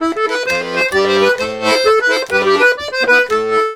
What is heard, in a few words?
Accordions; USB Soundscan vol.40 - Complete Accordions; AKAI